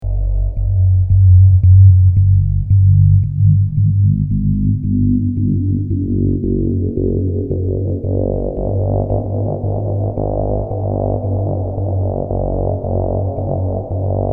Dreadbox NYX V2

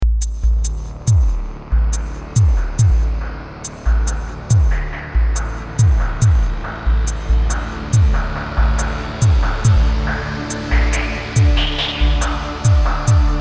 Isla 2400

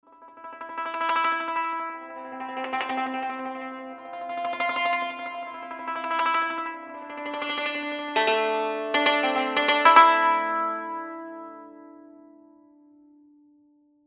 Yangqin; Phoenix Orchestra

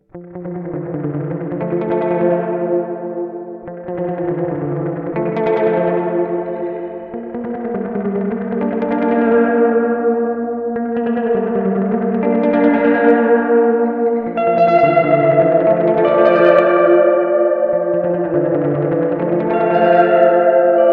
drum

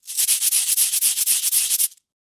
Magic Pre Cabasa ME64; Fredenstein

